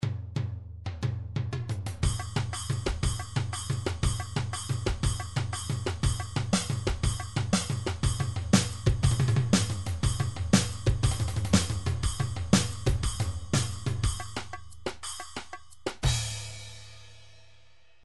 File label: midi drums tribal